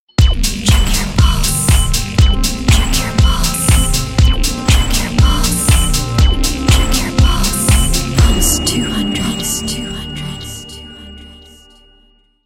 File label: Station Stinger; radio; Pulse200